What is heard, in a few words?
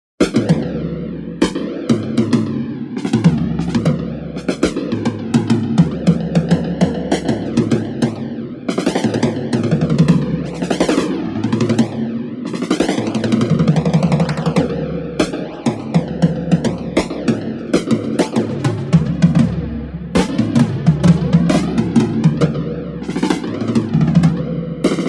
Phasing Drums